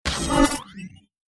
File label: battle defender dodge; hideout